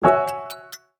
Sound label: Ticking; GPSMAP H1; Messenger